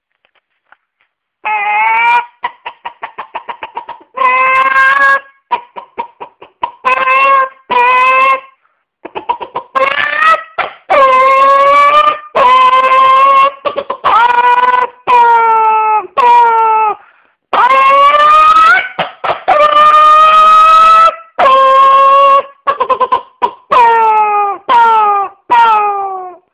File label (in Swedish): Kyckling